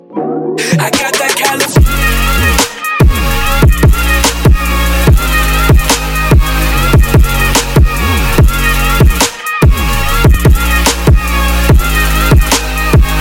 remix

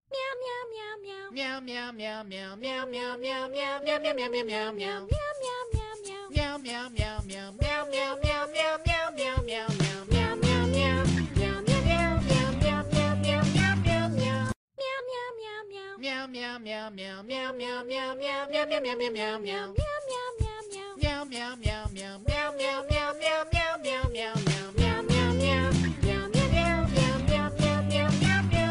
Meow Mix

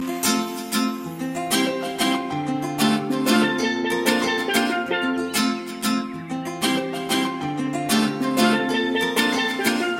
steel drum; laba; christian

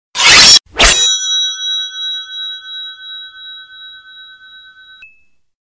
sword sms; ringtones

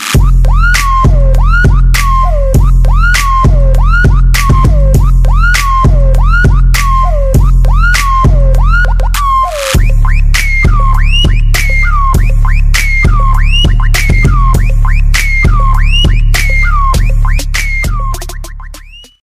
trap music

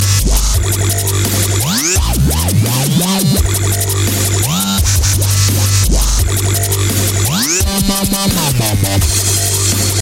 strange beats, ringtones electronic

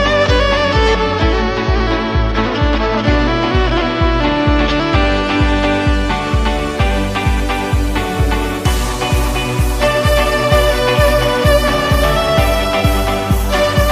violin; tomorrowland

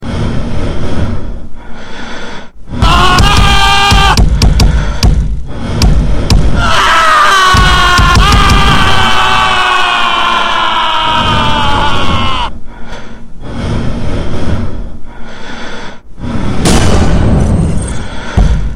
horor, rock

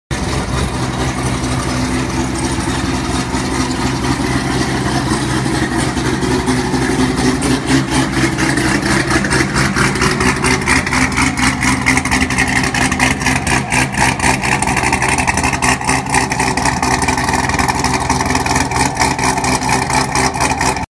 C7 Exhaust

